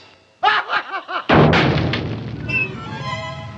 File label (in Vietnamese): am thanh cuoi meo; tom tom and jerry